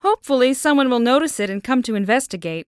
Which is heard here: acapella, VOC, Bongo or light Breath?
light Breath